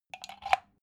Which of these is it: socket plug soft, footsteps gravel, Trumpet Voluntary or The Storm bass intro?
socket plug soft